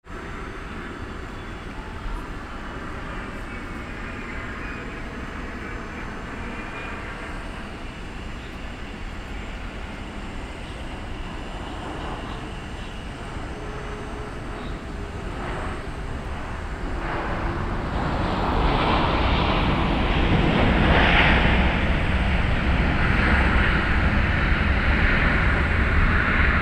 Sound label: airplane cabin taking off